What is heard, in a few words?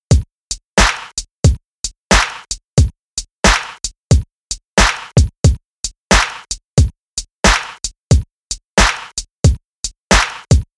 Quintuplet Swing Example